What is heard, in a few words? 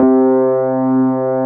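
Wurlitzer C2 - L; USB Soundscan vol.09; AKAI; Keyboards Old School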